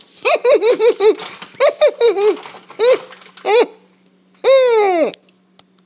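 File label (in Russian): довольно уморительный смех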